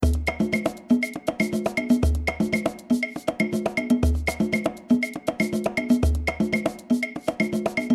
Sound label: mambo